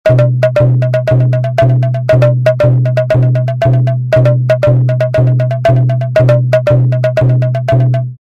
Indian Dukki Tarang; Drumwavy; Syntheway

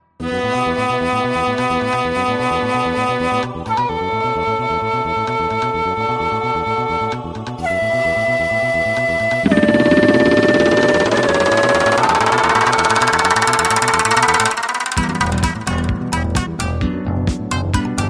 Shamisen, Koto, Choir; ShamiKoto; Mega Man ZX Advent; Syntheway

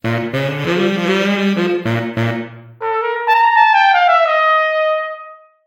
Chamber Reverb Baritone Saxophone Flugelhorn Wet; Virtual Room Reveb; Syntheway

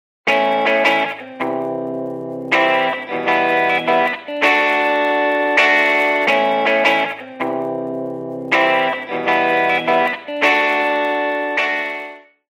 Overdrive Wet; Overdriverb; syntheway

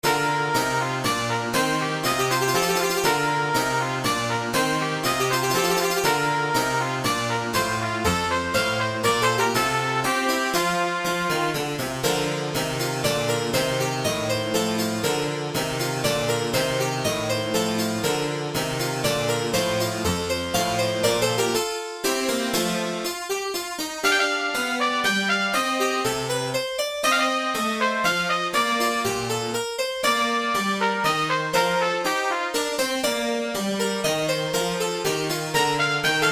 Brass, Harpsichord, Strings; Aeternus Brass; RetroMagix Harpsichord; Syntheway

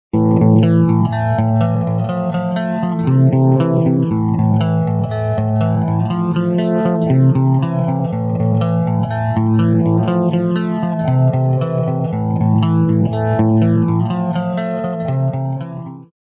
Bouncing Chorus Guitar Wet; Vox Quantizer; syntheway

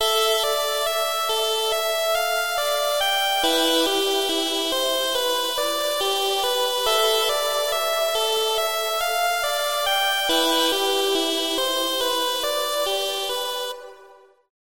Bright Nebulae; Astra Turbo Quasar

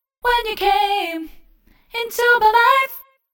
Female Vocals Wet BPM Synced Delay; 1-4T; 1-8D; Echo Chamber; Echo Chamber is a stereo reverb and delay plug-in; Syntheway

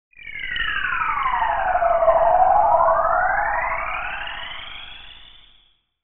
SFX; Synthelium; Cosmic Revelation

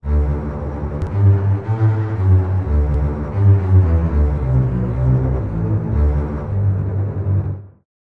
Strings, Contrabass Tremolo; Synthphonica; Syntheway

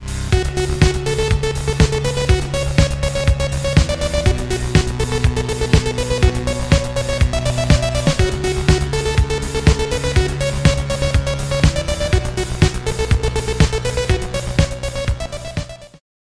LEAD Fuzz Lead; Synthractive; syntheway

